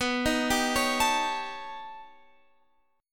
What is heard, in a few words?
B Augmented 9th B down Guitar Open F; B+9; arpeggiated; JGuitar